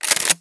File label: nova pump; cstrike; csgo